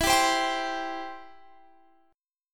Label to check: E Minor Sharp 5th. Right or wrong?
Right